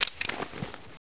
ammo pickup; svencoop; sence; mistake coop

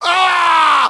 death, grunt; svencoop; st allies; nimble grunt

